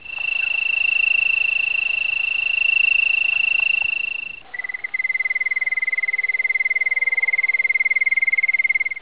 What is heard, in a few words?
tree cricket